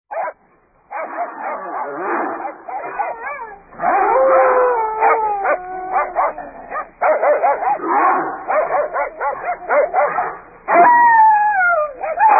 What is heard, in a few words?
Wolves